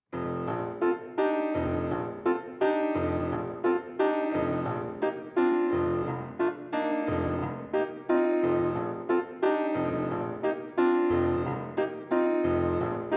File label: medium tempo